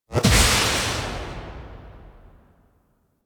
Impact; Warframe; Tenno; Grimoire

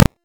Hunter Bow Ranged Arrow Projectile Start; Weapons; Player; Bows; Envoy Hunter Bow Ranged Melee

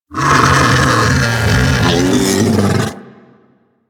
Vocal Alerted; Warframe; Hunting Critters; Undazoa

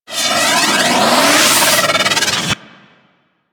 Missile Proj Incoming; Enemies; Weapons; Warframe; Corpus; Arachnoid Camper

